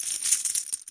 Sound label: coins rewarded; lightning speed